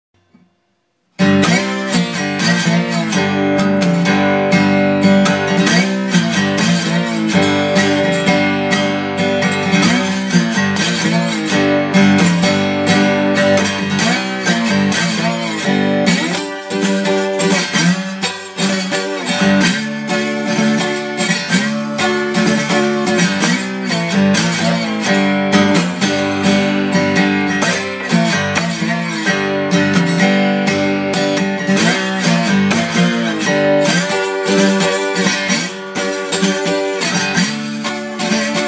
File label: new brass